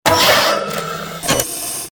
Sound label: hydraulic door